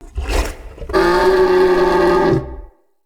Stag Vocal Pain Idle; Animals

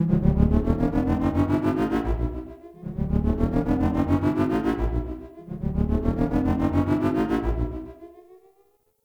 Arcade Misc; Warframe; Ambience; Nineteen Ninety Nine

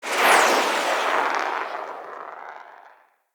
Siege Proj Whoosh; Level Events; Midrath; Warsong Prologue

